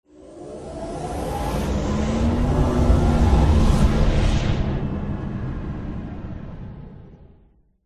cruiser flyby animation particle swish swoosh accent logo sci fi futuristic; alliance cruiser flyby; alien ufo